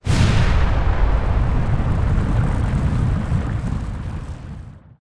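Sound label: explosion; Se Solo Bakuhatsu 02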